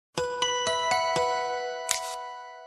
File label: sms